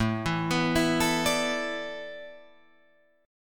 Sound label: A Suspended 2nd Suspended 4th down Guitar Drop D